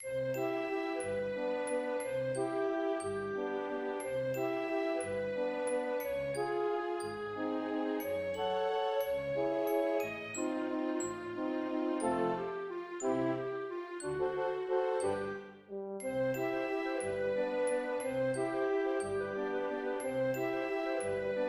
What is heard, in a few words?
waltz; christmascarol